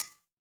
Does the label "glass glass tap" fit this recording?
Yes